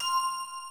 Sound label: MOVE; C64 Dreams; Multi-Sound Default